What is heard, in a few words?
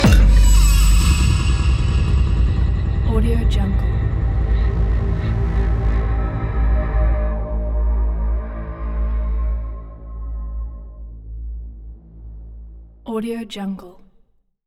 hybrid trailer cinematic tension hit